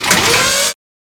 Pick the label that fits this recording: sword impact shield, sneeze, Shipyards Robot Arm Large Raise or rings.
Shipyards Robot Arm Large Raise